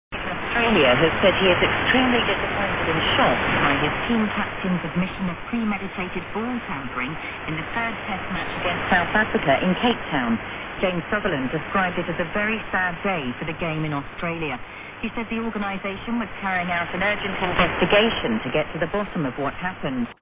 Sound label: SW 6190k; Woofferton-Nairobi; BBCWS